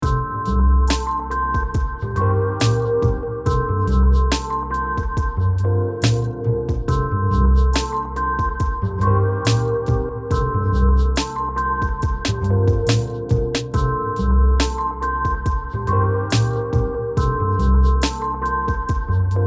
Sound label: loop calm